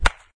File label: Hit ball; papas cupcakeria online windows gamerip 2013